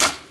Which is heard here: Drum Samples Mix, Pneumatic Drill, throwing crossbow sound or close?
throwing crossbow sound